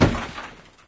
shutter; ambient; Source Engine; cstrike

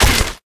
sapper plant; tf

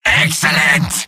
cheers; medic; video games; source engine; tf; mvm

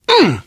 hurt minor, biker; voice

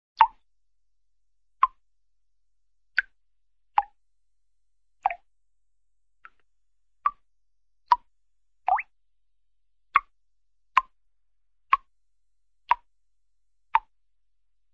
water, drip drips; cartoon

